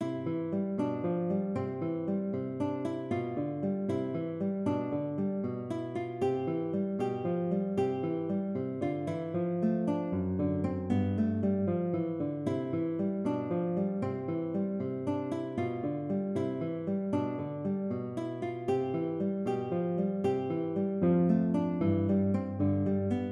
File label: Allegretto, konzertgitarre; Giuliani